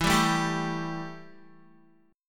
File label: E Minor Double Flat 5th, Guitar; strummed; chordshape; Embb5; JGuitar